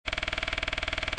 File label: GAMBLE CARD BLINK; Ancient Forest